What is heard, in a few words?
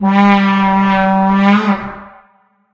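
vuvuzela; source engine; tf; football manager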